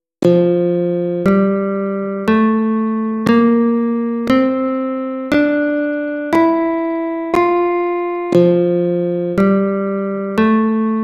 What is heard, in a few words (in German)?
F Dur Tonleitern fuer Gitarre